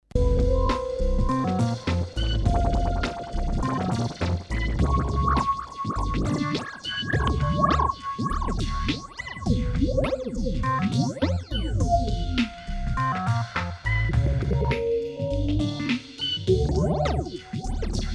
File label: Numark phaser